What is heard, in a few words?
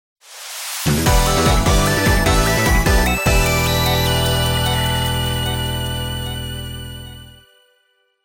Hacking Mission Clear (Jingle); Sonic Frontiers OST